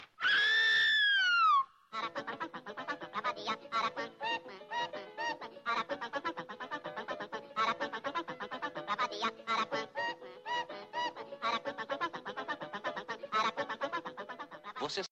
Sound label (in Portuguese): canto do aracuã